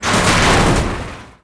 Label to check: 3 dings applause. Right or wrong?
Wrong